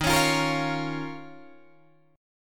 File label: D sharp 7th Suspended 2nd D sharp, Guitar, DADGAD